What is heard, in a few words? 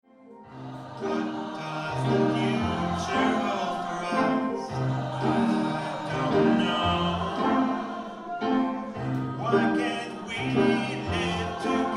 choir